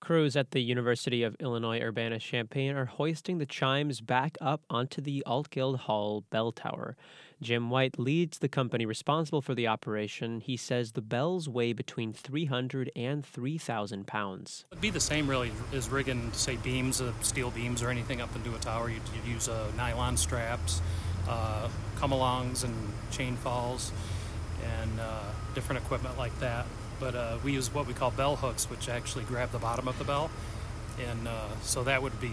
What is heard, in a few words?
chimes, Altgeld Hall; bell tower; University of Illinois; The chimes are returning to the Altgeld Hall bell tower as renovations continues